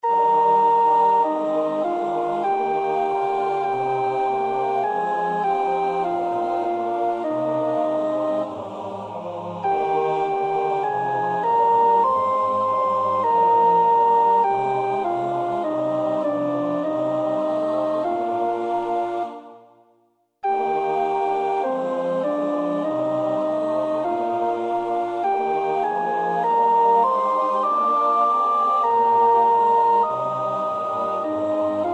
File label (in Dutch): sopraan; Nieuw Liedboek; NLB 556; Oefenbestanden